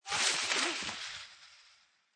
Skiing Snowboarding Slide Fall Distant Snow Slide

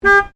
Car Ford Focus Horn Short; Ford Focus hire car; Vehicles; Cars

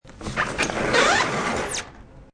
Slide Dr Opn Slw, Sliding door opens slowly.; Part of Sliding Doors (Sound Effects:Doors)

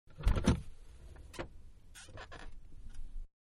Big Car Door Open Interior; Cars (Sound Effects:Vehicles); Manhattan Production Music

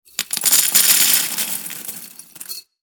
food pasta dry pour pan; Pasta ( Dry ) Poured Into Metal Pan; Food Prep; Restaurants; McKinney Sound

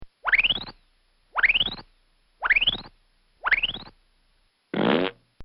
Comical Sound Series Zips; Funny Sound Sound Effects; Comedy

